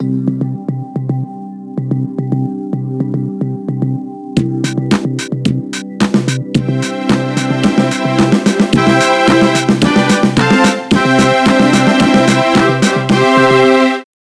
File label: MiniGame Start; My Horse & Me